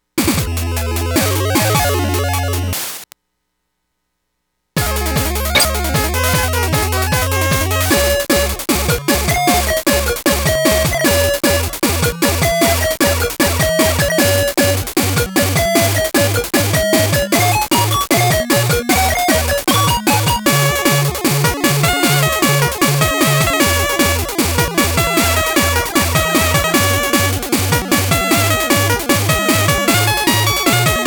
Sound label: FPGA GB no filter; gameboy sound samples; Inc Crash Dummies